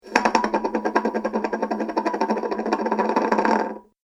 Saucer Wobble Speeds Up, dishware; Household Sound Effect; Home, Household, Restaurant, Diner; Andrew Fleming

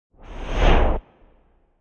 Whoosh Short Tail; Whooshing sound for video editing, movements, logos, flash, or animation.; Whooshes

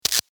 sparks, Electric Spark; Single Spark , From Guitar Cable

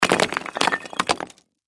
Crash Rocks Drop Debris; Debris (Sound Effects:Materials); Blastwave

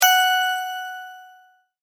multimedia tone correct

